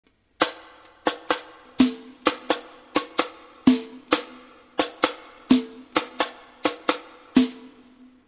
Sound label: riq